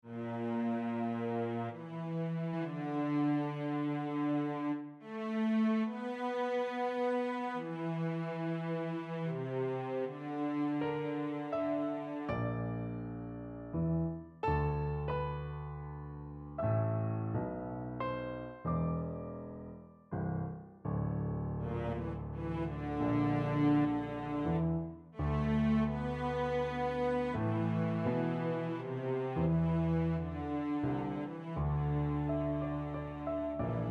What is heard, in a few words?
Cello Sonata; Budapest excerpt